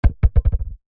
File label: sport basketball set down ground